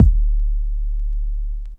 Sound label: BD, Dance Mega Drums, HIP